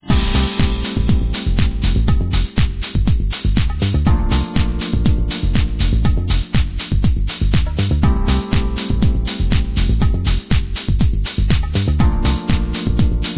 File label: elevator music; Source Engine; nippersounds